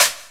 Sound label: TICTALK DRUM